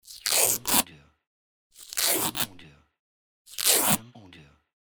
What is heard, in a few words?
Scotch Tape